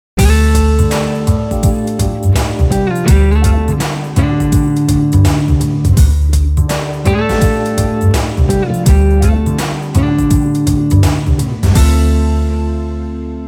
easy ride

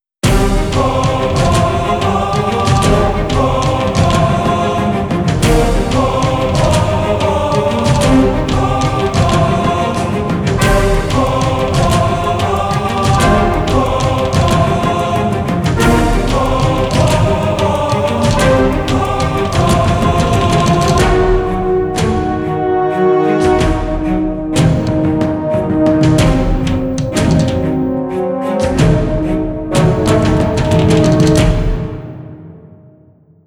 symphonic story

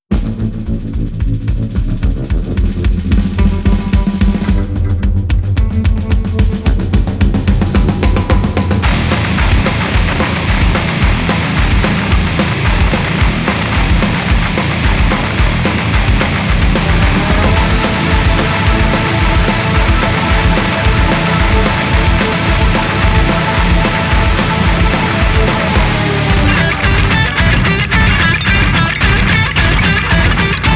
Cry out loud

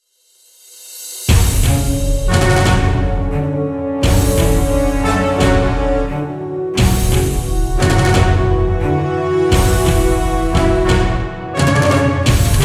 cine splashes

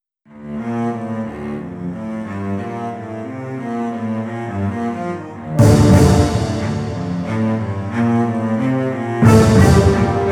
commanding full

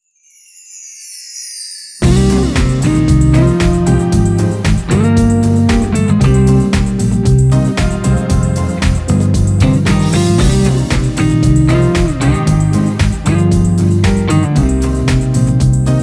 red bossa

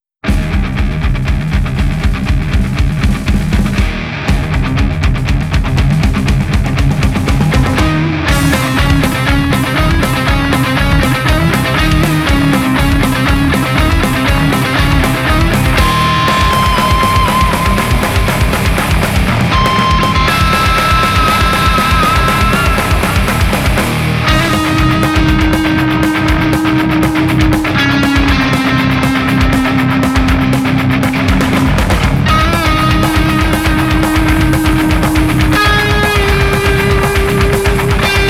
Devil Machine

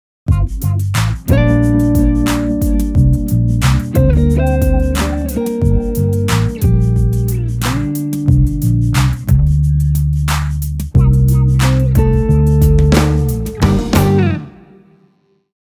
slow moves

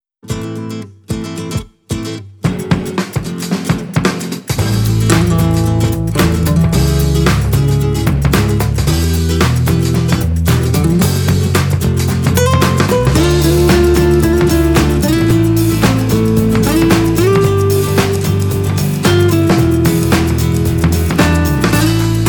spanish love

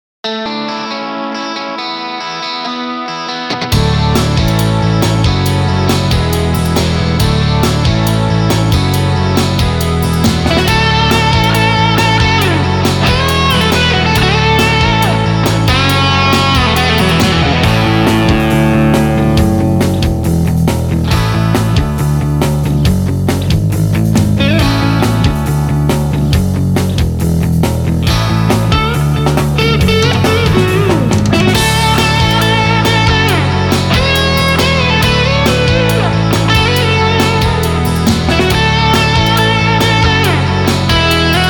win the game

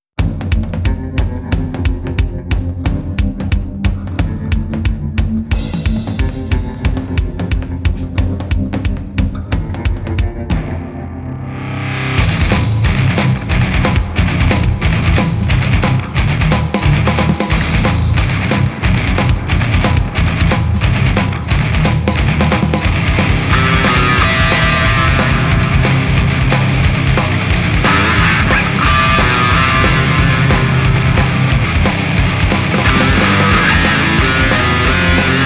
round rock

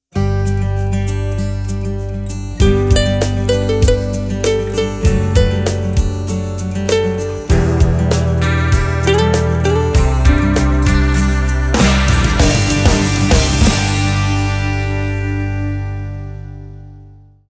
sun fun pop version

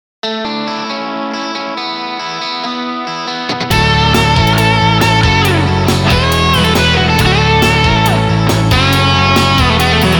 win the game

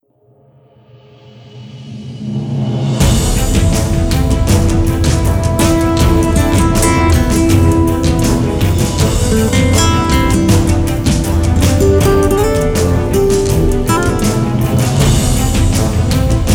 silent rage

